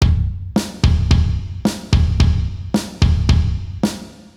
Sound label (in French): Rythme